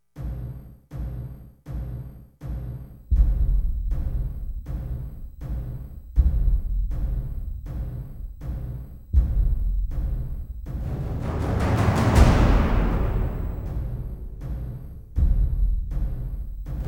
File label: drums